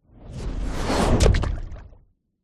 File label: fantasy magic spell dark whoosh into impact small water splash; zapsplat